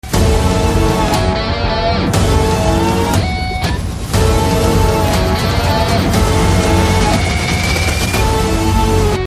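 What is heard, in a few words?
Trailer background music ringtone; Naandhi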